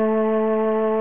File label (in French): violon